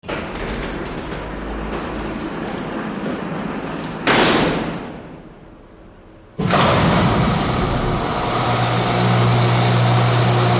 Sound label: Freight Elevator Doo